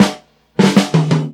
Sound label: Tom Roll n Break; Drum Digger